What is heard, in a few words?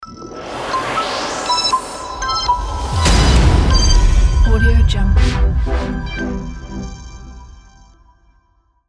energy logo